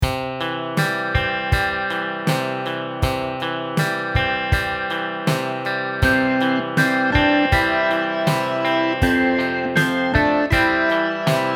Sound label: Pease Porridge Hot SLOW Tempo, ukulele; Rainbow Ukulele Play-Along